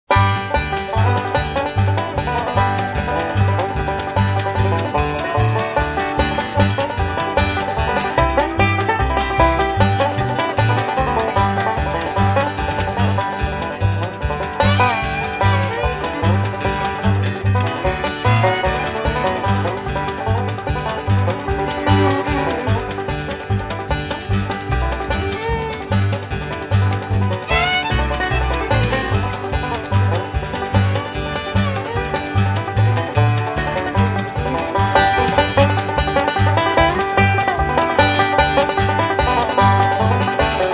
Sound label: Banjo Breakdown; music on hold samples